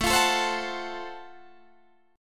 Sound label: F sharp Minor Major 7th Flat 5th A